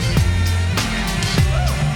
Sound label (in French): Accords